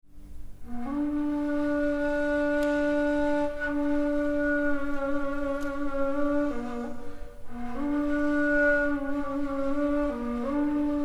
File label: ney